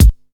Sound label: silky bass drum single shot a key; kick drum samples